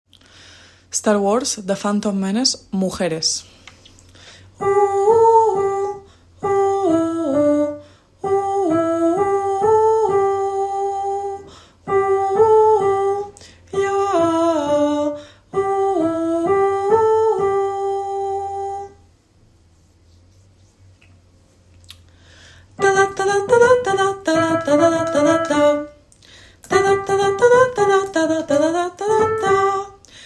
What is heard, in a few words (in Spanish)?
phantom mujeres; The Phantom Menace